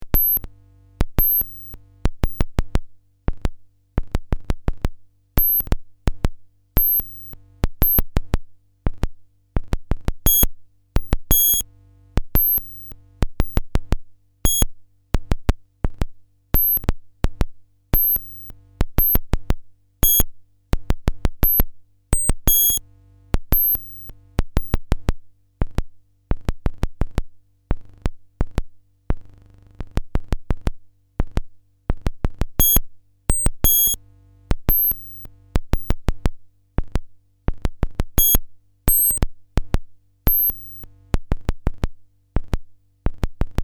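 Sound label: electric yamaha; circuit-bending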